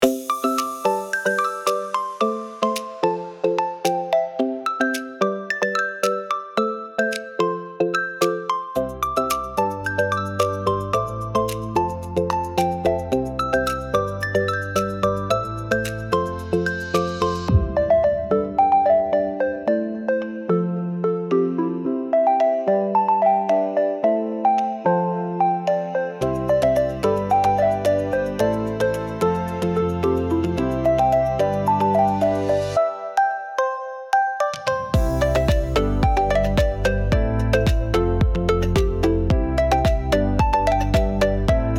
Tropical vibrant island light

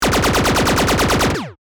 Sci fi weapon machine gun bursting

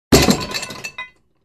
Barbell drop on the ground

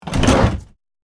Horror survival game menu ui tab switch

